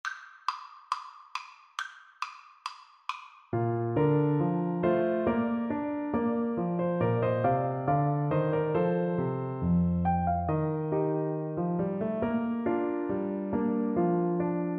Clarinet

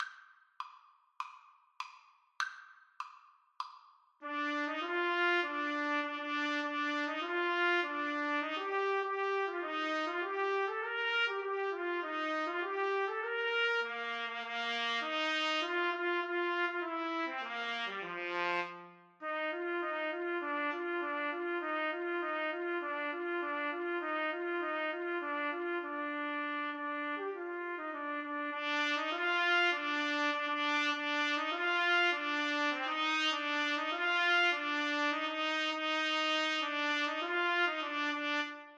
trumpet duet

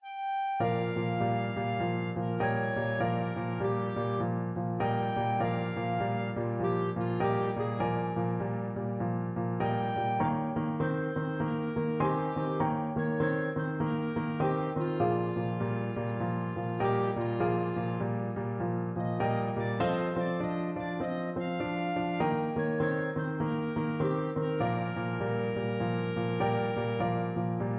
blues, clarinet; cc rider

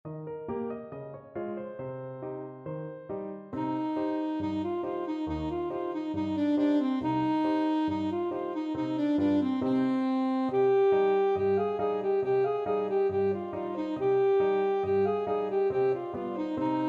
patch tanz, saxophone

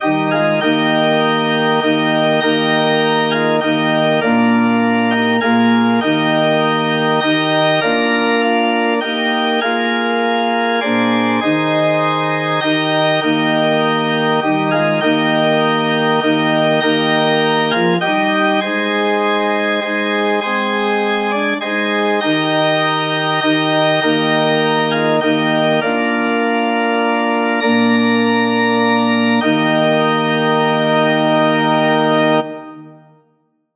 just as i am, organ

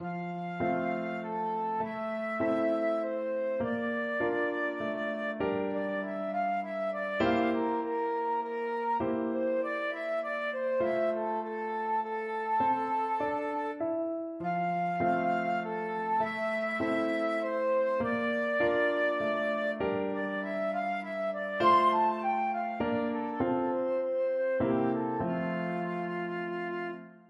puccini la boheme, flute

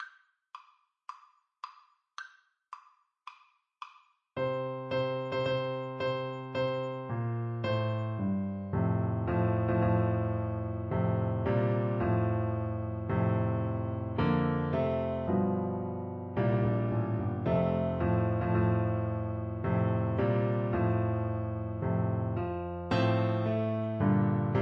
tenor saxophone

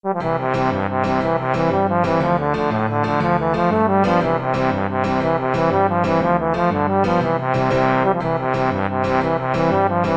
irish jig medley, trombone

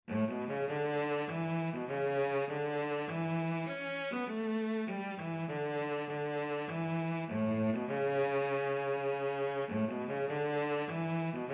wind blow, cello

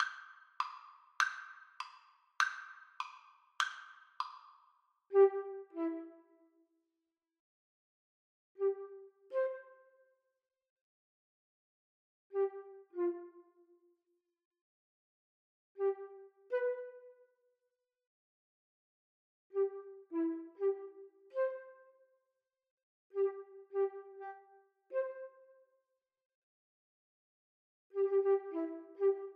Flute duet

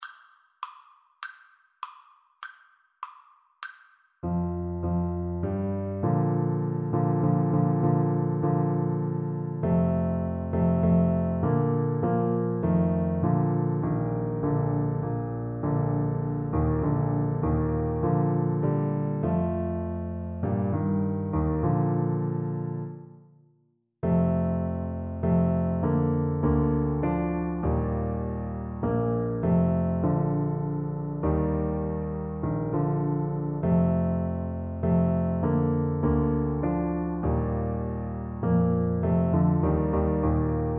to the river, Tuba

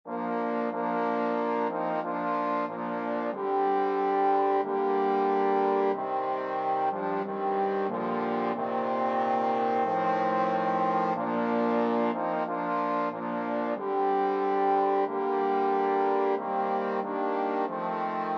in the bleak, trombone quartet

